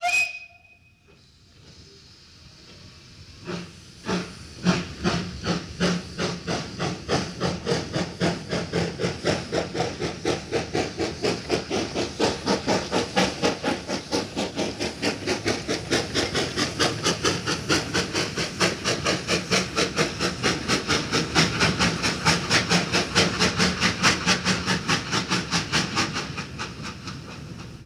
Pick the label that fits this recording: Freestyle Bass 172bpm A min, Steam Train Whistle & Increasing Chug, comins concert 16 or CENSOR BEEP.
Steam Train Whistle & Increasing Chug